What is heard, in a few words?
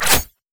dance strike; LoL; fiora